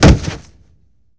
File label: Body Fall Heavy; Hand To Hand Combat